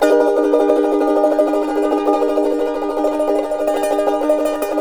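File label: CHARANGO, A minor, tremolo; Spectrasonics; Hans Zimmer Guitars VOL-2; CHARANGO CHD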